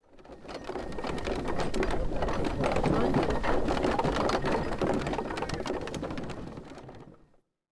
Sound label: City Ancient Small Cart; Ambient; Civ4 warlords